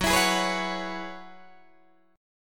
Gb 7th Suspended 2nd Suspended 4th, Guitar, Open D